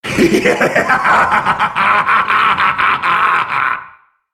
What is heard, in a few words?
laugh; Skarner